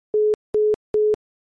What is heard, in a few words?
busy